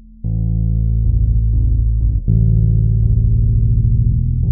BASS

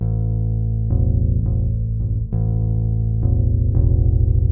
BASS

